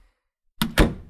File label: Door Close, Wood Door; Free Wood Door Pack